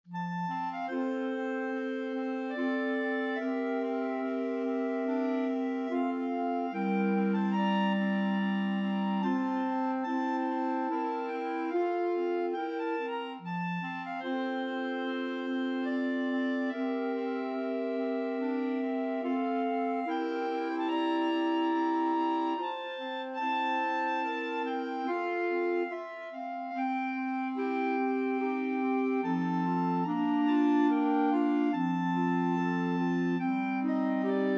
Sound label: clarinet choir